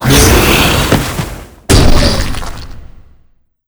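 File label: boss death, monster; Fireamy; lava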